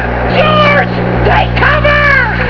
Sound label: bombing